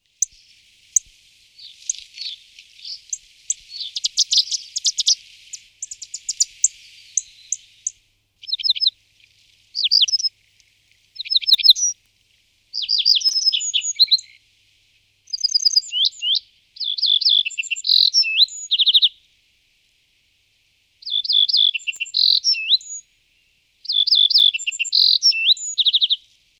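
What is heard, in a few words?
ovsyanka kroshka golos, little bunting voice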